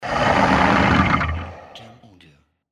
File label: Alien Monster